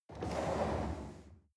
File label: chair wheel roll on floor soft